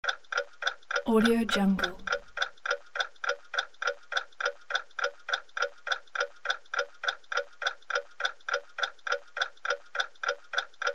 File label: clock ticking